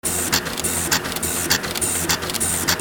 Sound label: INDUSTRY